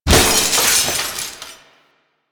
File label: Glass Window Break; Warframe; Ambience; Nineteen Ninety Nine